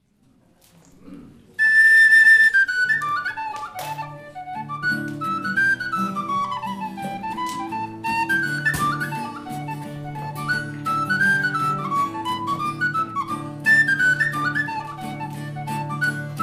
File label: Hornpipe; Belfast; folkskovlund 2014